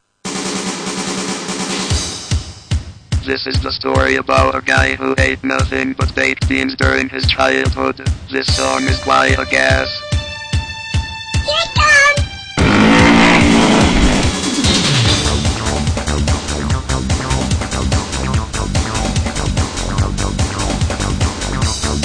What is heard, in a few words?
Fart song